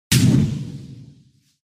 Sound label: Sfx Assassin Blink